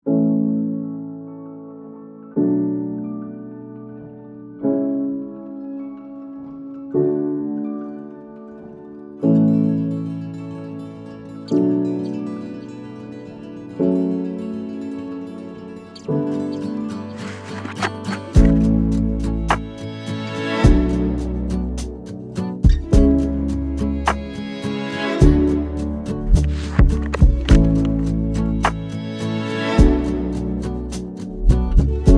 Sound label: Acoustic; akustisch